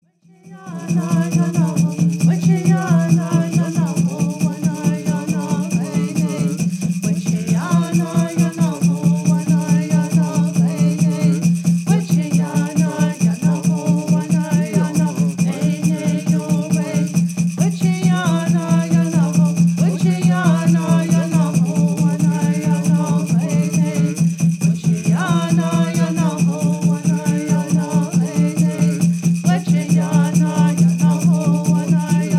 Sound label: Straight Song